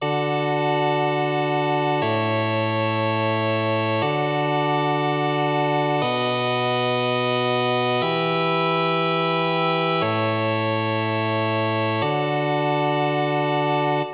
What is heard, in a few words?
chords